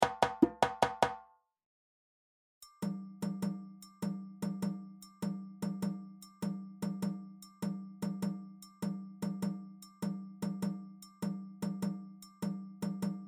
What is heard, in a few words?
Kenkeni